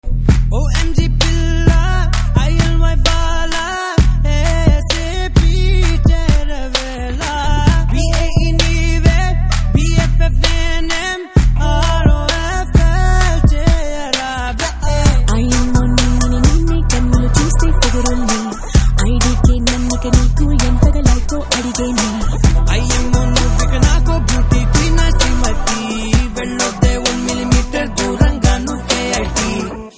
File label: Telugu Ringtone